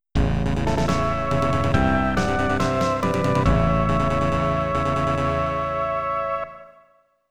Jingle, MT-32; Gulf War Soukouden; pc 9801

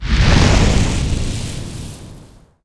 Enemy Bigflame Active Start

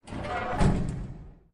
Close Mine Door; Lethal Company

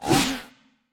Dash; Going Under; Caffiend

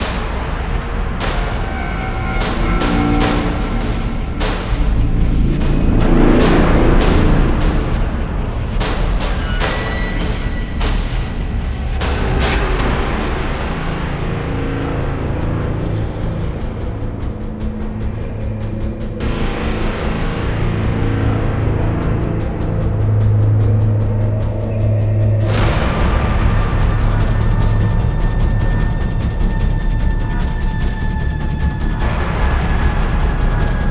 Base Loop low

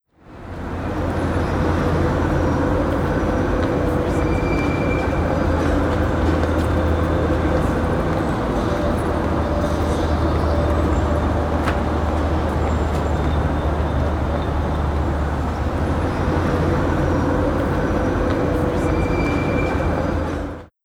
Cities nyc Traffic

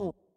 Hover Hands

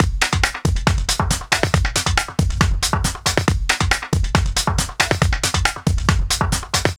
first fight music; Ben 10 Protector of Earth; Wii

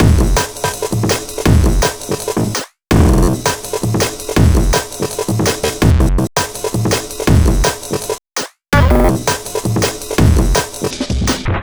Break 165BPM

